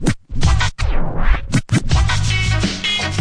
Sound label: turntable scratch; Scratching and Turntablism; DRUM LOOPS and BREAKS